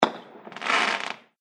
Fireworks Single Bang With Sparkle; Single Bang And Flare; Firework Sound Effects; Celebration, Party, Holidays

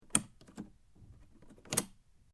Suitcase Lock Open; Travellers case; Locks; Objects

